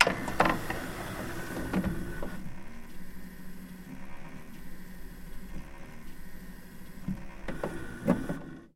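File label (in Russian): zvuki cd-pleera, CD player sounds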